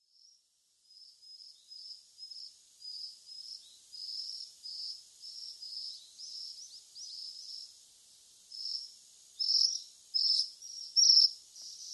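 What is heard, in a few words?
Ryukyu minivet, yambaru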